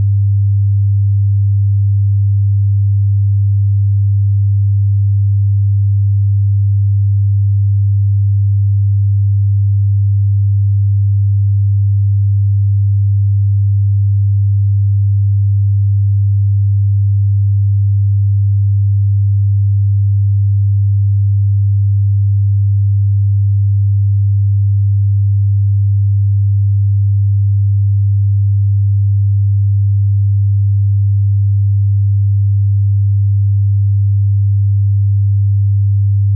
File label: 100hz